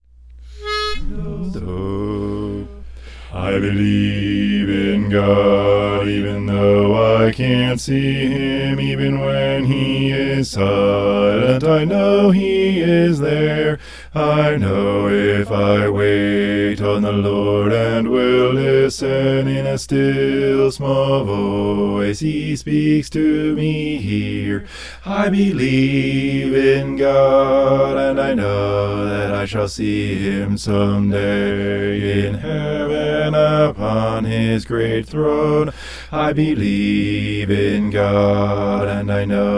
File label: bass